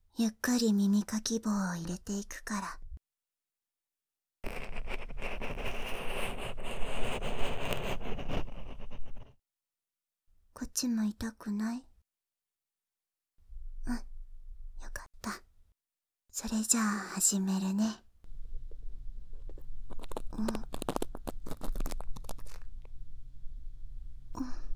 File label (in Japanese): 癒しの耳かき